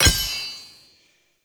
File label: Blacksmith Hammer Strike; Characters; Blacksmithing; Friendly; Tuvalkane